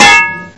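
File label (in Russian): звук удара по кастрюле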